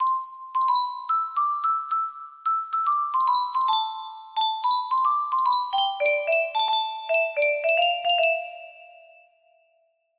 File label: music box